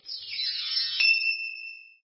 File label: Magic Tinkeling Sound With Ping